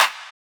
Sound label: Clap